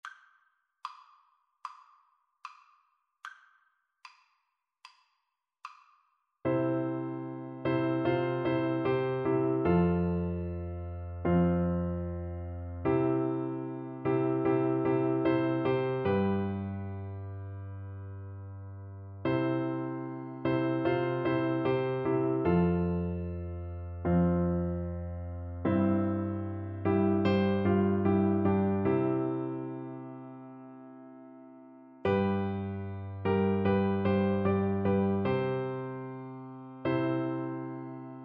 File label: what a friend, Flute